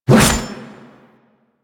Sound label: Reaper Melee Whoosh; Warframe; Wraith